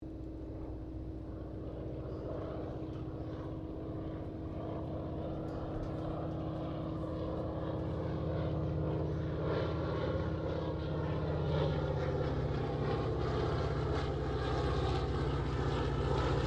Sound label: AIRCRAFT 1916 SOPWITH PUP REPLICA PASS STEREO; Replica of a 1916 Sopwith Pup; Airplanes; Vehicles